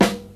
studio snare g key, snare tuned to the G note. Loudest frequency: 1148Hz